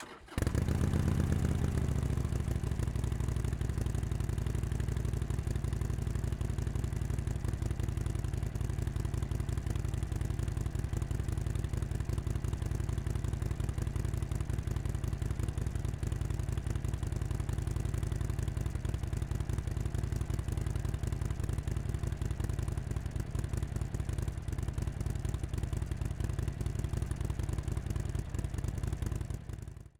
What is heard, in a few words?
1200T Idle, slip-on mufflers for harley sportster; Exhaust Sound - Stock Idle; 2014-2018; Rinehart